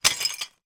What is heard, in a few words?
foley broken dish pieces in bag bash; zapsplat